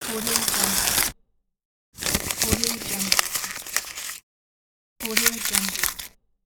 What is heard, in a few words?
tear paper and crumple